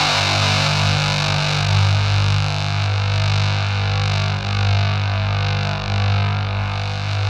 ZWEEP; Spectrasonic Distorted Reality 2

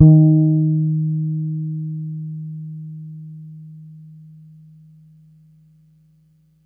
Es D#4 hard, Bass, Pedulla Thunderbass; Giga Samples Collection

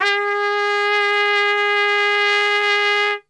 G#2 PIC STR L, Brass; Best Service

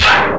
BLAST; East West; Global Sounds

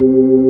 B3, Drawbars

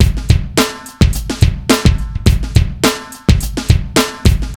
Funky at 105, Drums Beats

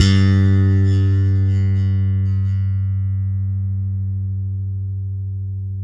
SLAP G 3, bass; Spectrasonics - Bass Legends CD 1; ALL THUMBS

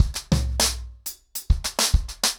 100BPM, ACOUSTIC DRUMS

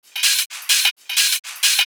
Hihat Loop 128 BPM; House; Best Service; House Definition VOL-1

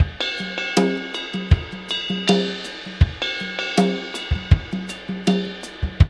Tom Groove, Laidback; Sonic Foundry (Sony Creative Software); Drum Tools

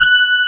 horn G5, synthesizer horn; AKAI S6000 CD-ROM - Volume 1

